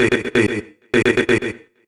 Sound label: House Loops, Vocal Loops House 128BPM